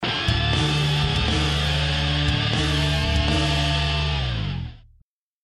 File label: Fail; Command The Toa Mahri; Flash Games; Game Music